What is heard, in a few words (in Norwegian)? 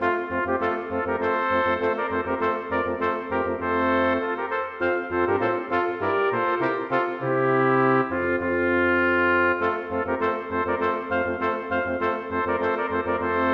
Intro for brasskvintett; Bang